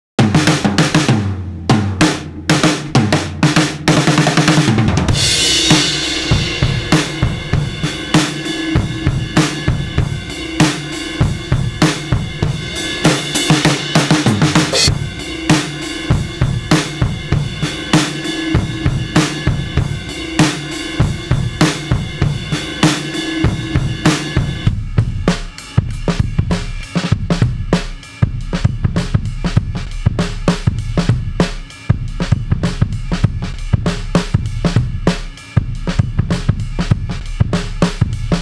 Flying Jazz 98; AMG - Ruff Cutz

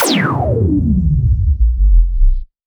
Sub Kicks, Bassdrums

